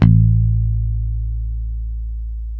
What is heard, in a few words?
HIPH A 2, Bass; Spectrasonics; Bass Legends CD 1